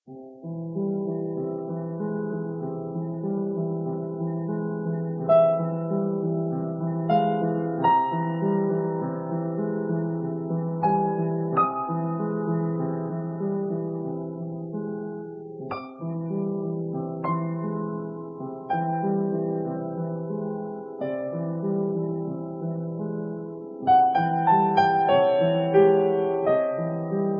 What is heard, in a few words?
pieces for piano